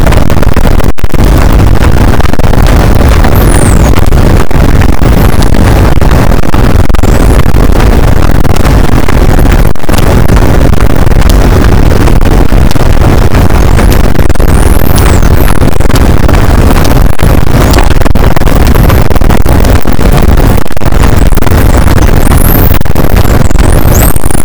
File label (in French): cachalot positif, boat; Physeter Macrocephalus